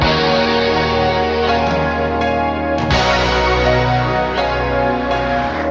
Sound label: keys and guitar; loops; Phonky